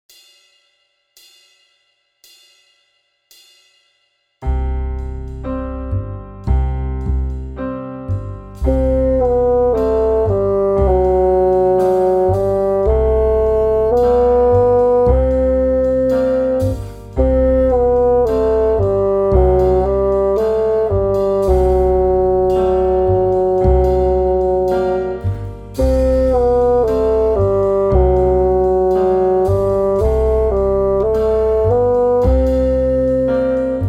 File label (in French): basson